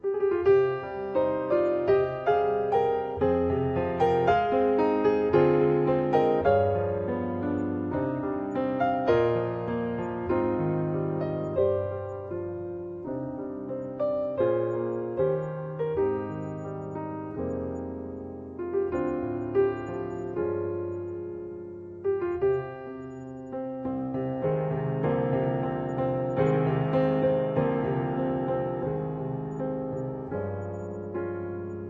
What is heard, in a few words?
Music Box